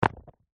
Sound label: sport soccer boots drop on hard ground; zapsplat